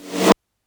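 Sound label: Snare drum reverse, Yamaha DD-6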